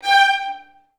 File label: G4, Advanced Orchestra; Best Service